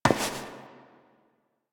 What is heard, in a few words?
Step Metal; Warframe; Koumei